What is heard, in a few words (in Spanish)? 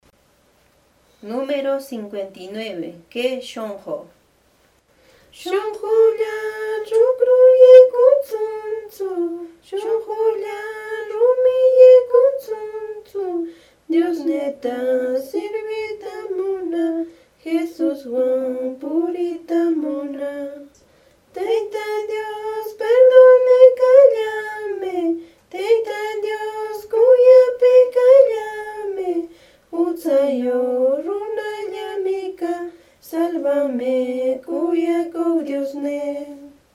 cancion